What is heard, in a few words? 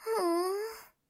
hmph